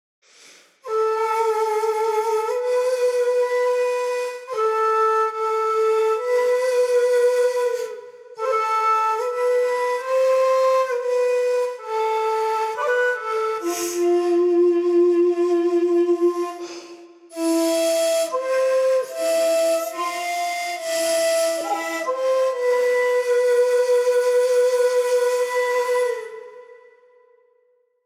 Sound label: shakuhachi sample; issunboshi